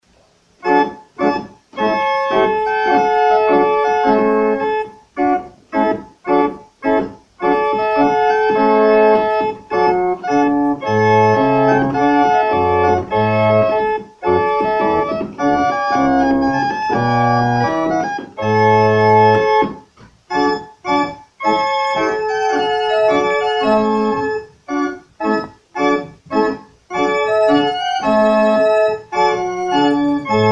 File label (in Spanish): marcha entrada, organista; purcell; bodas; palencia